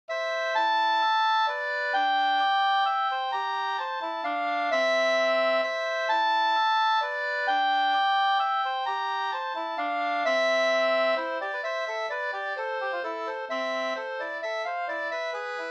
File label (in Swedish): polska fr Osterdalarna